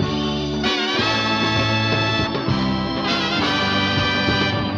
horns, jazz loops

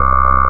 alien, Synths; Notes

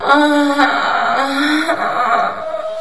female orgasm

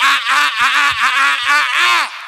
"ahah ahah ah ah ah ah"; Voice; ooh ahh

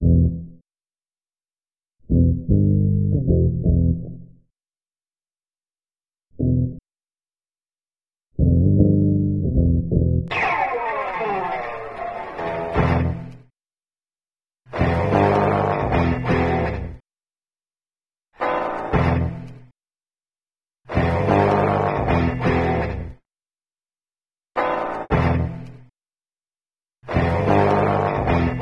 guitar rocking and rolling

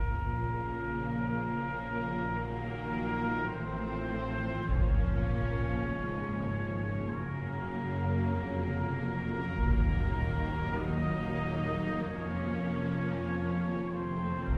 horns, Sad; Loops

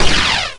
laser

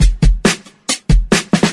Drum loops Boombap

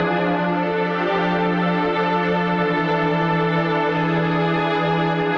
keys, Sad

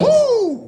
"woo"

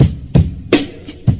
Drum loops, Boombap

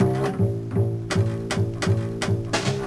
bass, Loops

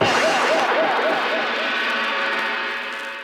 ringy crash and sounds

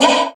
huh group of men; ooh ahh; heh huh

